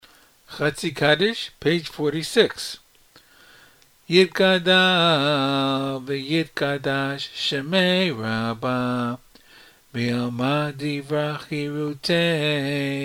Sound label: Chatzi Kaddish